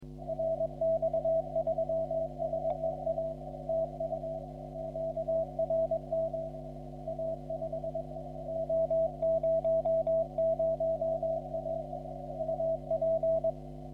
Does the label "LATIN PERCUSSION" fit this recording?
No